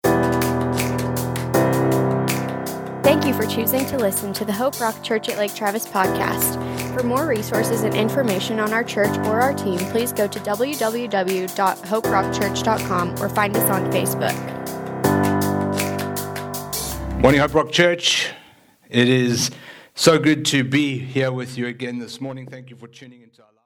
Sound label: Rock